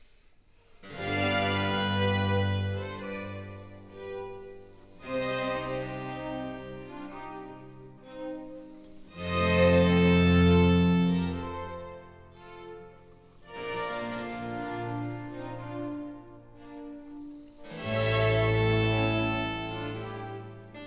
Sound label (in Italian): Concerto per archi in Si maggiore, Largo; Antonio Vivaldi (1678 - 1741)